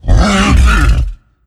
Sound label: queen jump; Attack of the Radioactive Thing